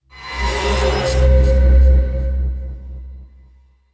Side Case Start Serious; Lost Judgment

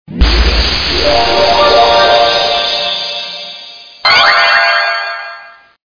Jingle KISS; Touch de Rakushou Pachi-Slot Sengen Rio de Carnival

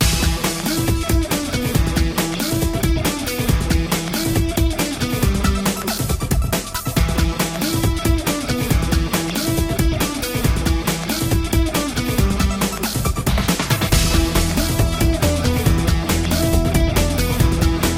Music Menu; Moto Rush GT

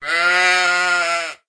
Sheep Man Panic; Farm Mania 2

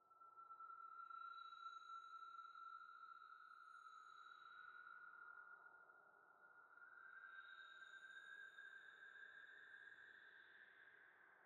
Main Reverb & Delay; risk of rain 2 engineer edition 2

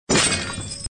Sfx Enemy Knight Dead

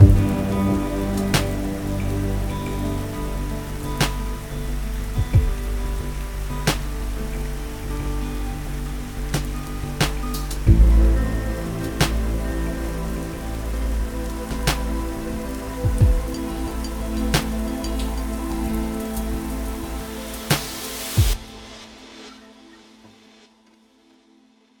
Loop

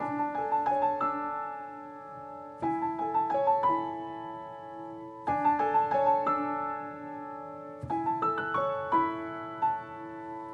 Music Level Memories

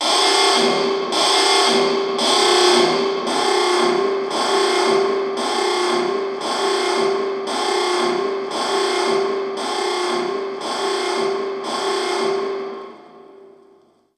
King Salmonid Incoming

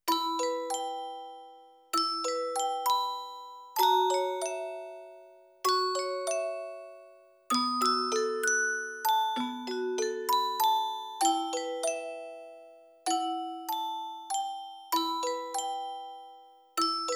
Piano Ballad version; Dragon Ball GT Final Bout

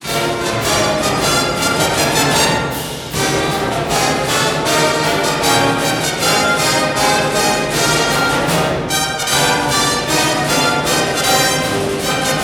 Broadcast Outro; NCAA Basketball 09; PS2, PS3, Xbox 360

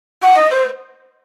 Surprise Dialogue Box (Shakuhachi); B Path of the Teal Lotus

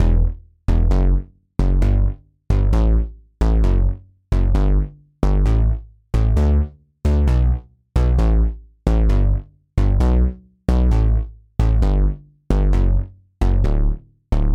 Poly bass

